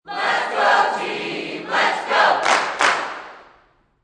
Sports Crowd Chant Cheerleaders Lets Go Team Lets Go With Clap Single